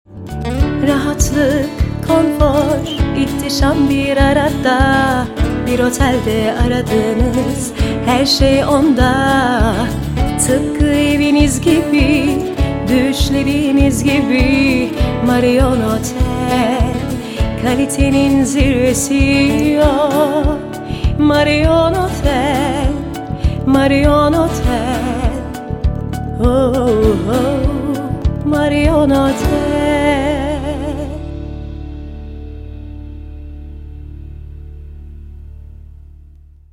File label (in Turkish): vokal